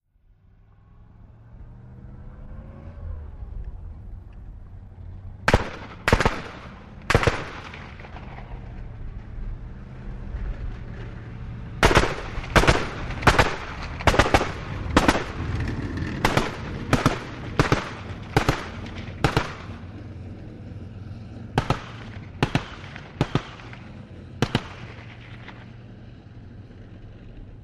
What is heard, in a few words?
Apc: Exterior Driving And Firing. 50 Caliber Machine Gun. Dual; armored personnel carrier